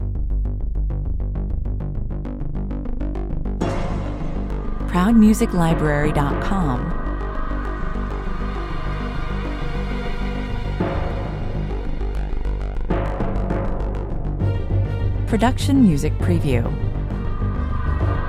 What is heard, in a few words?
epic series epic suspense 100 bpm; Proud Music Library